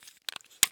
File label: Syringe Gun Injection; Medical; Blastwave